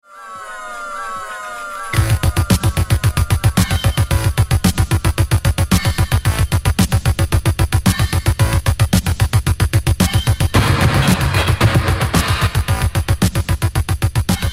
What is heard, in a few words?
Synth